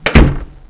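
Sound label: door close; kingarth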